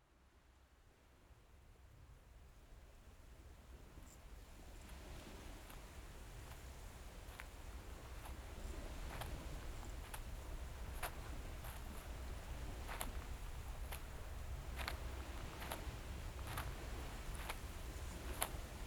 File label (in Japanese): 猫島猫餌場環境音。さまざまな猫のにゃあにゃあ; 猫の餌場で餌やりぞなもし！